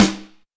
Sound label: SD BONUS, Drums; Best Service; Real Mega Drums VOL-1